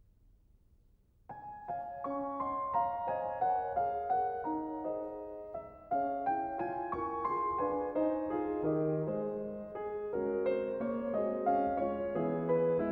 Improvisation for piano in D flat major (Allegretto); Poulenc; Piano Music